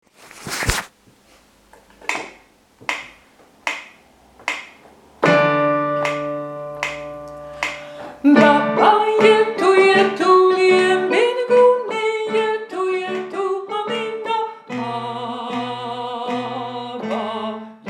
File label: bas; hostivarsky koncertni sbor